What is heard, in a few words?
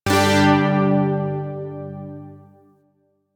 Shawzin Chromatic Chord; Zariman Shawzin